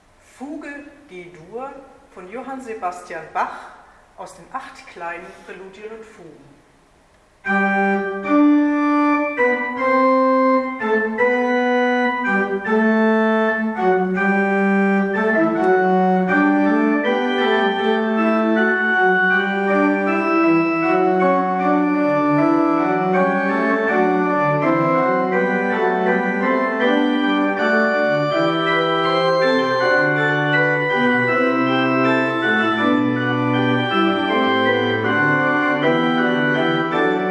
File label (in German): bach kleine fuge g dur; jsbach